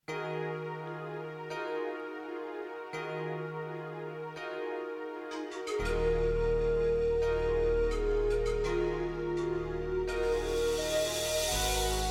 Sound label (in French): Chant, Beaucoup de nuances dans la voix - Français soutenu